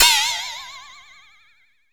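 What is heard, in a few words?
FX FLEXATONE, PERCUSSION; AKAI S6000 CD-ROM - Volume 5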